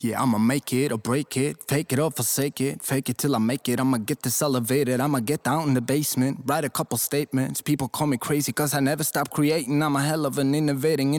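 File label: hip hop 100 A minor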